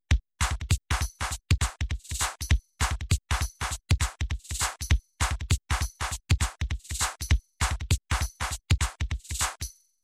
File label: drum pattern